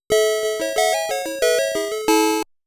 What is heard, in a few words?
Challenging Stage Start Music